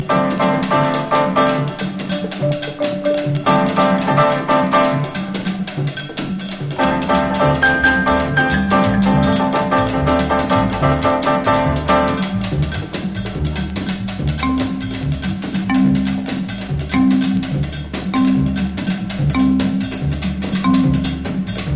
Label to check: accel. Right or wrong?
Wrong